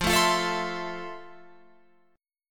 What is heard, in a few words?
F Major 7th, Guitar, Open F; strummed